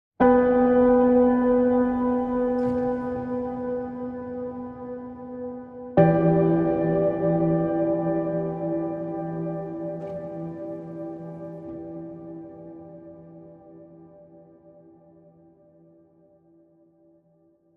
Voices and Piano and Handbells Only

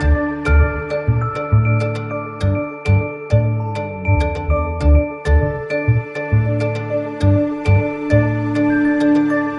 Loop No Drums

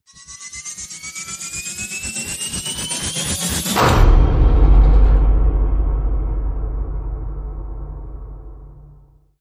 Rise To Hit Missile Launch

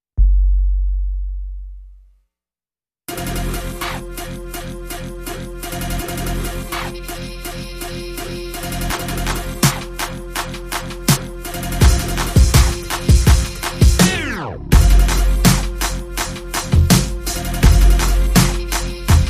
No Vocal

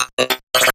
Choppy Buzz

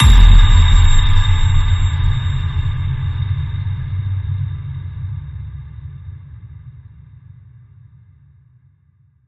High Ping, A sharp

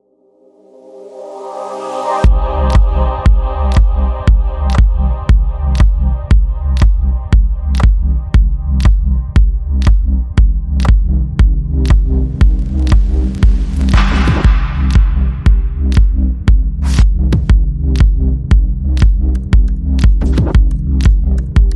Adagio In G Minor Remix Atmospheric Version; Albinoni

